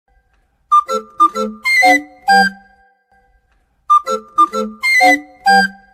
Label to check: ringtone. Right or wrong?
Right